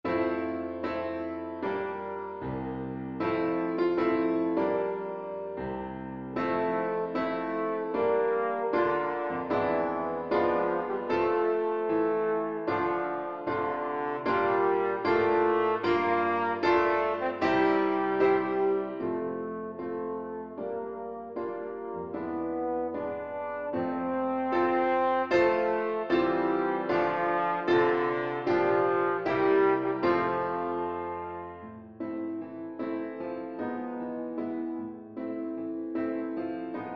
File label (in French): trombone et piano; cuivres